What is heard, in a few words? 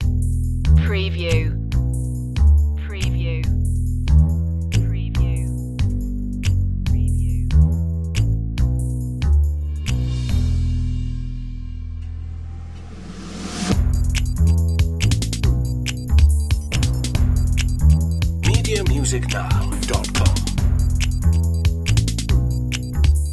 bass and drums